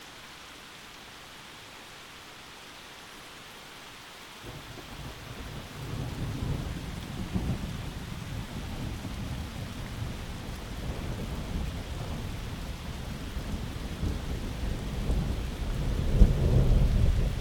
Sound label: Thunderstorm; Calm